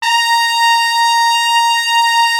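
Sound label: trumpets section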